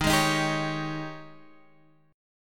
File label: Ab Major 7th Suspended 4th Eb